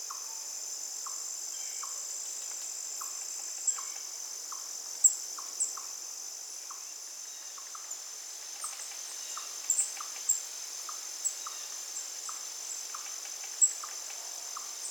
Ambience Forest Birds Woodpeckers Crickets Insects Light Wind Leaves Loop; Nature; Frogsong